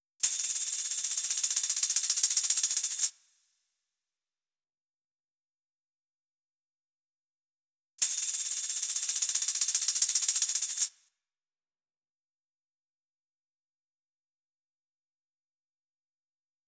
drums shaker

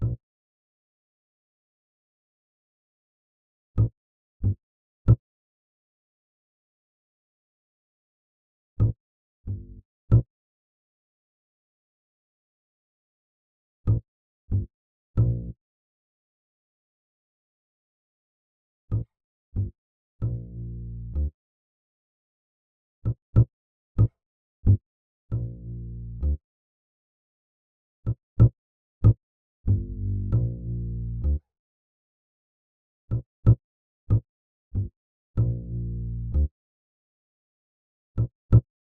bass

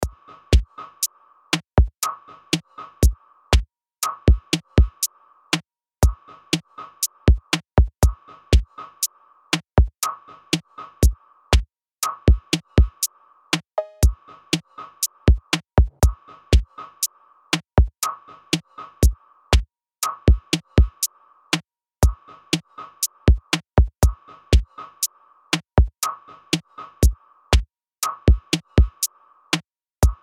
808 Groove 120bpm

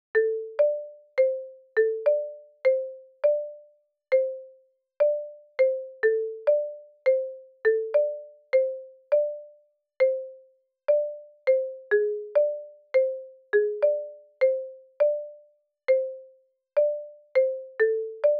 Marimba

